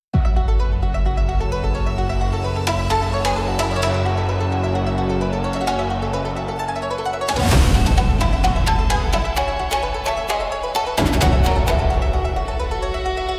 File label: Thunder Road Ronin, cinematic japanese style battles